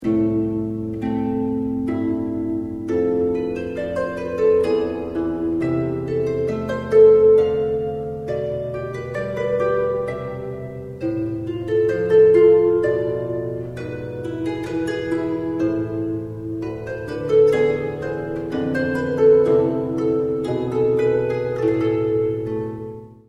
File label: arpa; historical harps; arpadopia